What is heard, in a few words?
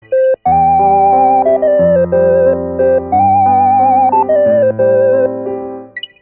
Chord 7